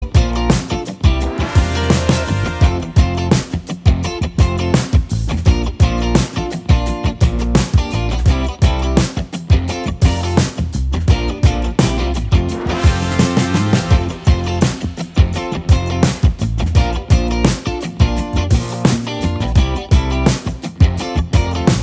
Manchmal laut und rockig

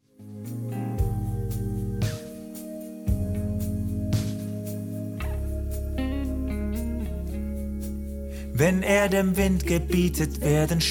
Lern Bass

